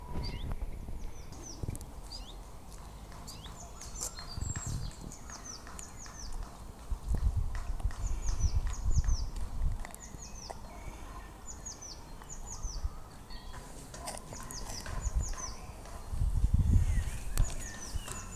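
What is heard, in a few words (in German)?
blaumeise zilpzalp amsel rotkehlchen zaunkönig; vogelkunde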